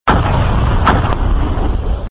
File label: bomb; neimeng